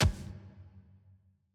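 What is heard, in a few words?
ambience room polygon punchbag training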